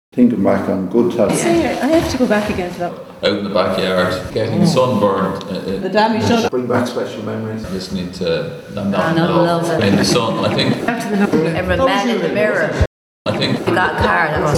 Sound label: Folk Choir Group Session Conversation song; inside out bagenalstown folk choir